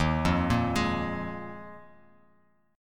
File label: Eb Suspended 2nd Suspended 4th, Bass Standard; Ebsus2sus4 arpeggiated; JGuitar